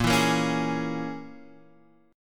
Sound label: Bb Minor 7th Flat 5th, Guitar, Half Step Down